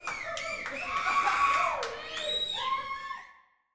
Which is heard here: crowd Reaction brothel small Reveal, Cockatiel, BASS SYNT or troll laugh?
crowd Reaction brothel small Reveal